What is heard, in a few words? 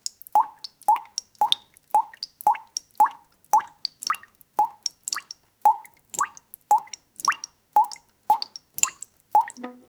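water drips slowly from the, sink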